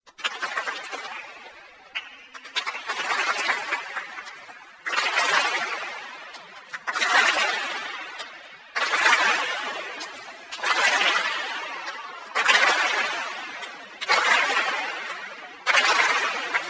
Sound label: applause